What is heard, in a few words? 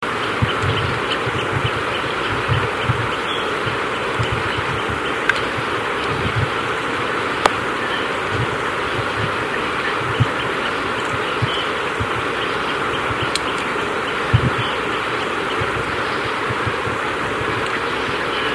dusky piha